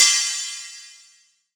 crash cymbal sample D key, Royality free crash sound clip tuned to the D note.